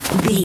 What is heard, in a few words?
footstep snow walk